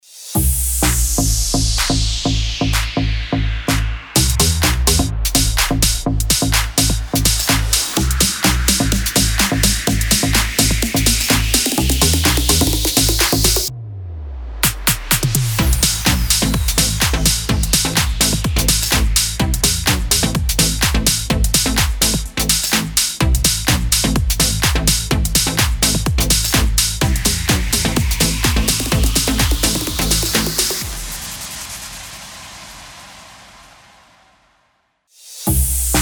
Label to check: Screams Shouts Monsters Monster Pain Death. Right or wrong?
Wrong